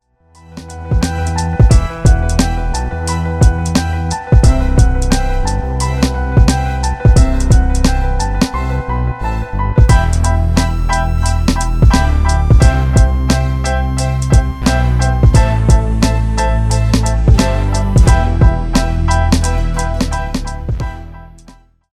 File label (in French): instru rap extrait